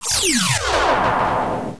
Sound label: shrink; Revista CD Expert 42; funrun